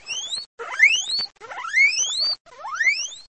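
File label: guinea pig squeal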